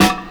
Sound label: sparkle snare f sharp key